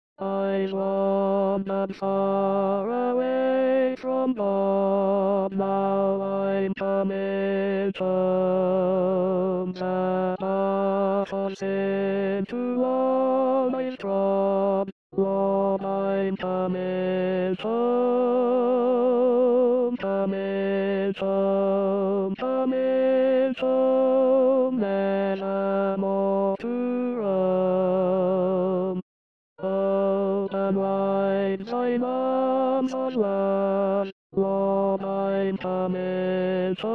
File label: Lord I'm Coming Home Tenors